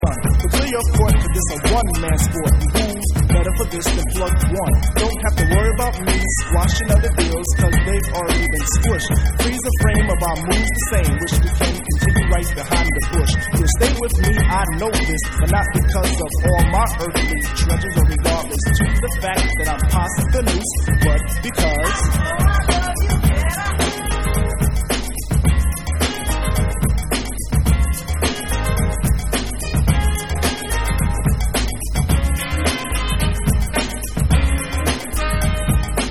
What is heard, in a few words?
Bass Mix